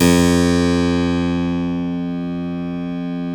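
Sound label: F1 clavinet right, Keyboards Acoustic; USB Soundscan vol.17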